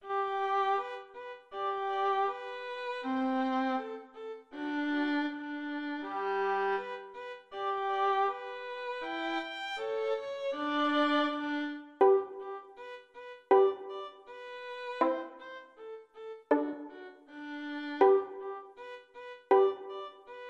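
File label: Surprise violins, violin trio; Haydn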